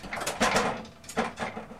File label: jolt, train; OpenBVE